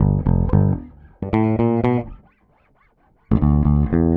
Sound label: 114 Dance Pop Bass G; Pop Rock; E-Bass; Pro Tools Essential Loops; Digidesign